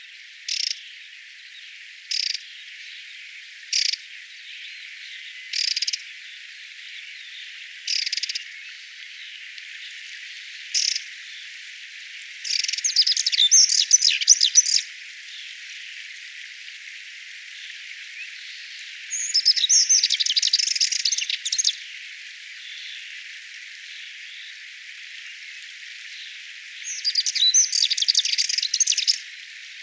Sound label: Moltonis Song; Hugh Harrop